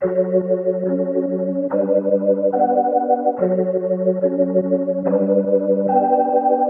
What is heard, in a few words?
Melody Loop - 143 BPM F# Min Chords; Melodies; Loop Stems; Cymatics; Oracle Sample Pack